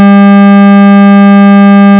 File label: sine